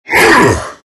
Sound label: grunt; Terrorblade